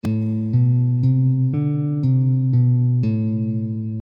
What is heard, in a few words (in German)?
alternate picking uebung, gitarre